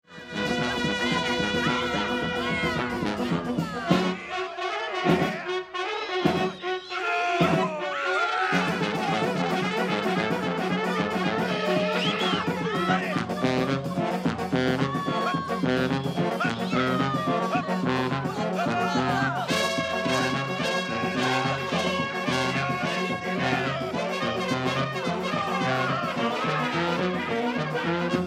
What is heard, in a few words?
Bulgarian Hora